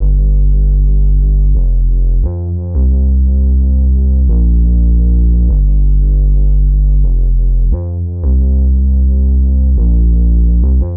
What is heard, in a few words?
D&B Bass Loop; neuro